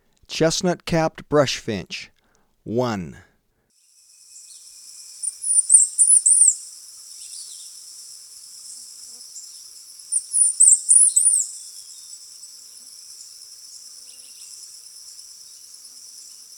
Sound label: Chestnut-Capped Brush-Finch, Birds of Ecuador; S. America